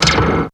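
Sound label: METAL, FX percussion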